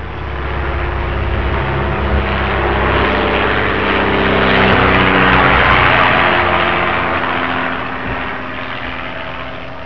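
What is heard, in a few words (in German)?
Flugzeug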